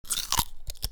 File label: nibbling radish; human; NS Studios - ultra sound collection